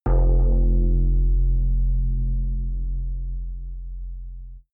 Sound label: synth bass bounce echo